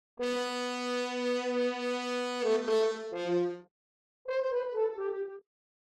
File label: Horn